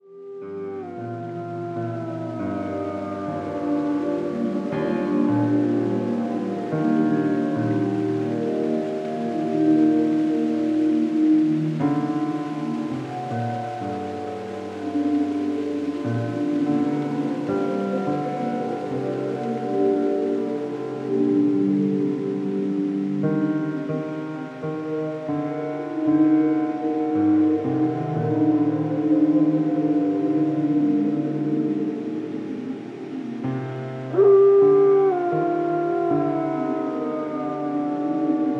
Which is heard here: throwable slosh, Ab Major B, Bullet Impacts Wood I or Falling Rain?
Falling Rain